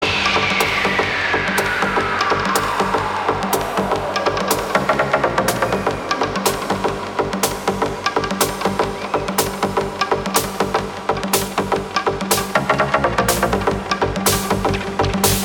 House Jam

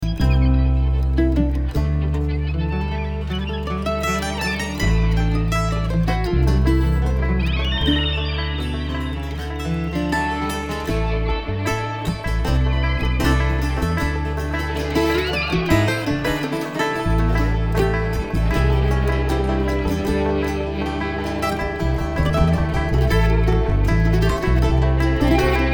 Traffic Jam

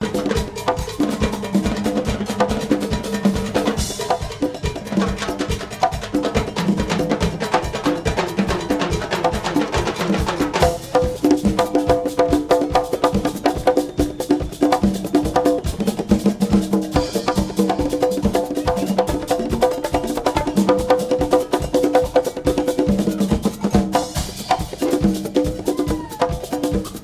Double Dig Percussion

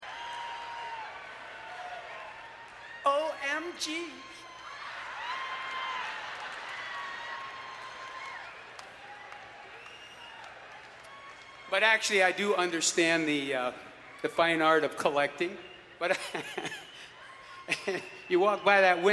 Guitar Talk

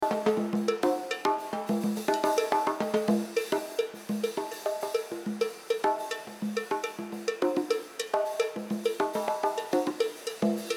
Drums

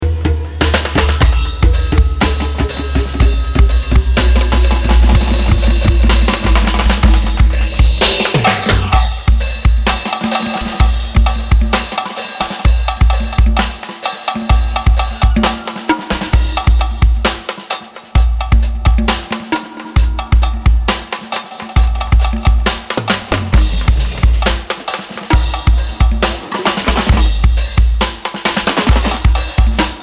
Drum Dub

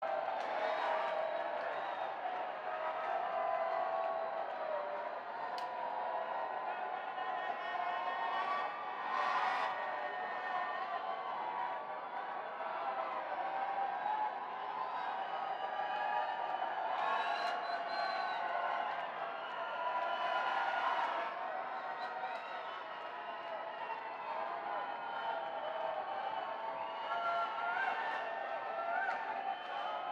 Banter Crowd

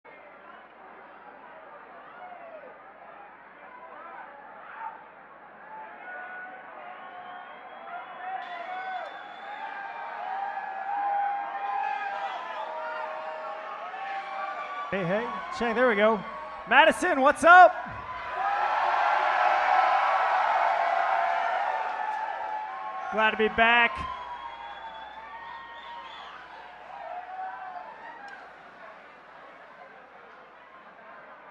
Crowd Banter

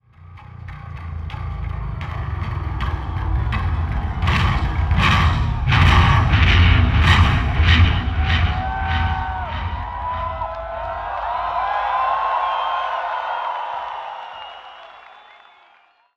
Bass Solo

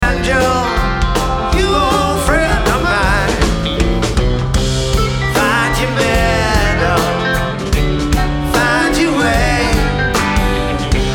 Banjo

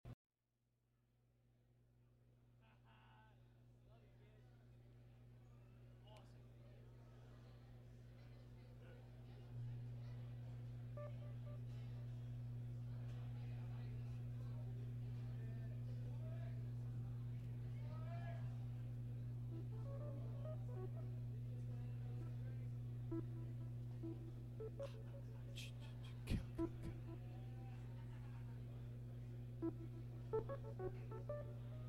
Tuning

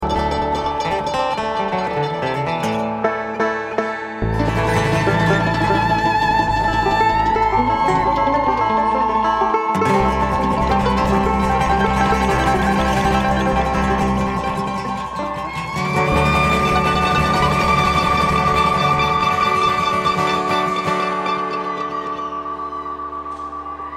Traffic Jam

